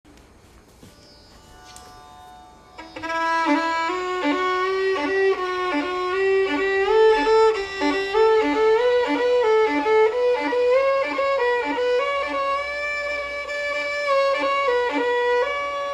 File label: Pedal patterns